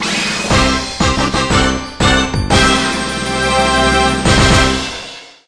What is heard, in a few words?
Duel result win